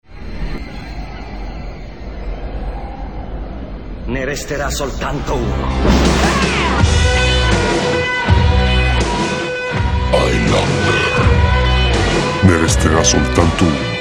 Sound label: Jingle; Highlander